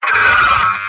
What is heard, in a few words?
Medic pain severe